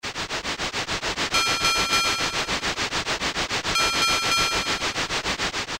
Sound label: Train, Kawai K1m